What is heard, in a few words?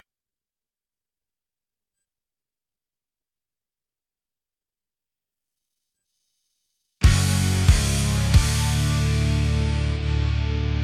Instrumental